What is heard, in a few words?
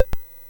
Mini Golf, MOS6581R4